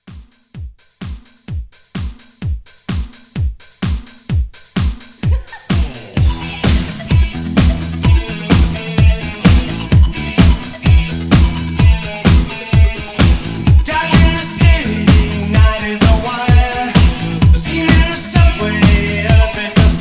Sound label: 80s Pop Re Drum